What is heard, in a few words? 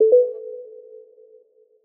device connected; Linux; Celestial 2.1